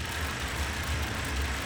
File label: reel Spin; grand spinn progressive